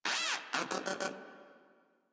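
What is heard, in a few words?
Moa Aggressive Vocal Studious; Warframe; Pets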